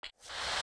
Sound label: Steps Push Crawl Ice; zelda; OOT